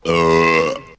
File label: burp short